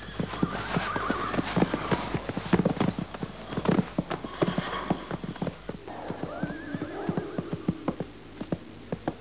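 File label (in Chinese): ma jiaosheng, horse neighing; jiachu jiaosheng; jiaqing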